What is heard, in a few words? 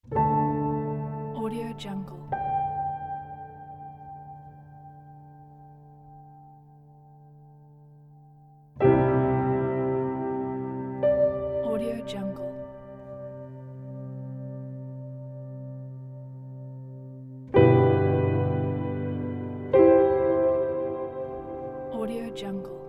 sad cinematic piano